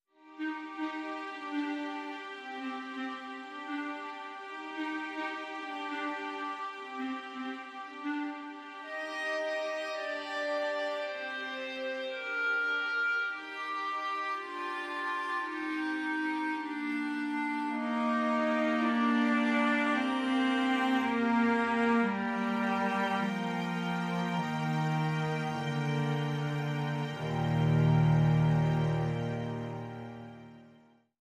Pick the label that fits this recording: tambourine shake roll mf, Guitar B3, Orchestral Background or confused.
Orchestral Background